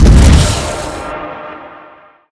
propulsion zap, weapons; deluge

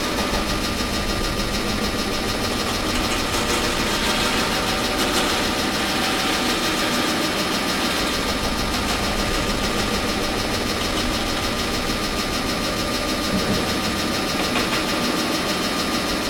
boss time

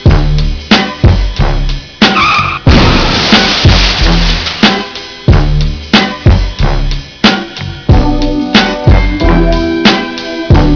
music; DE - ST City

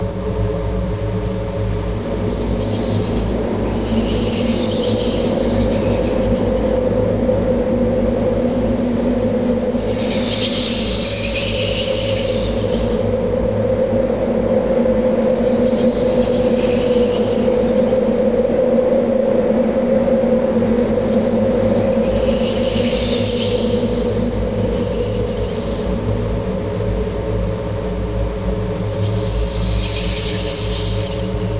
cavern loop

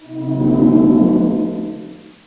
groan

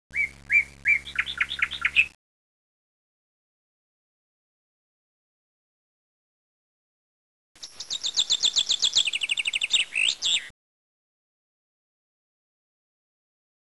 birds; de flow

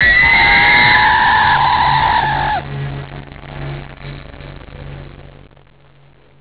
terror; cs poltergeist

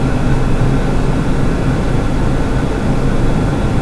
pipe; de season

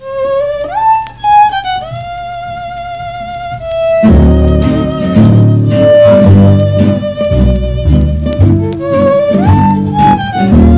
jazzy

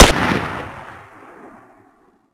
sten shoot, weapons; svencoop; ww2projekt

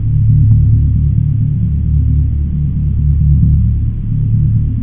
power gen; blacksite

